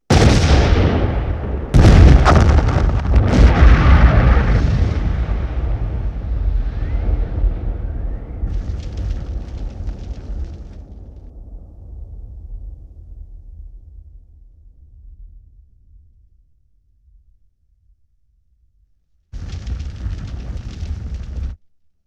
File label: Explosion, 5.1 Surround